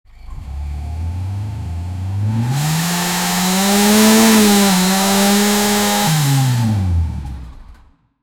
motor v8, intake

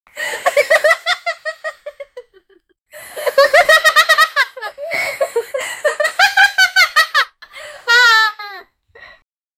horrorestic girl continue

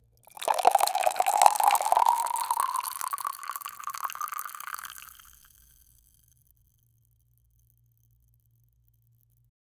thick chocolate pouring; dessert

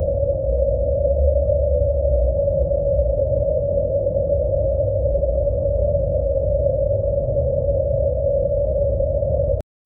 spaceship

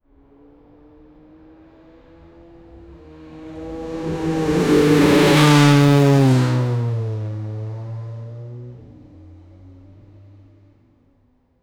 nascar engine passes by; motorsports

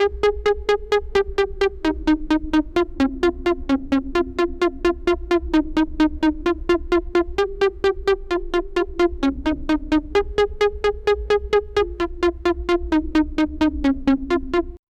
looped music of a typical, analog

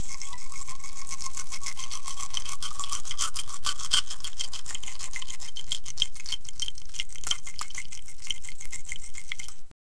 a realistic sound of spre, food preparation

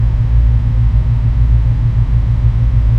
soft humming of an effici, air conditioner

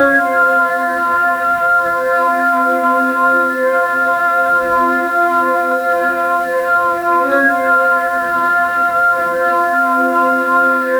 create a vintage psychede, drone

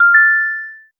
messaging app notification; notifications

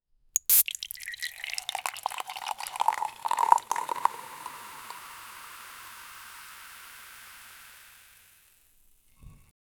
beer from a tap being, bar

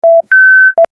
sound when kid gets a, correct answer

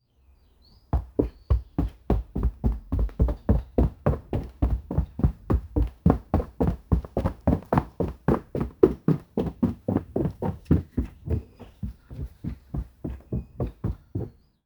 the sound of a dachshunds, household

